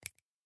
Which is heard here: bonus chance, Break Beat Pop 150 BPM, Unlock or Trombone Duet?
Unlock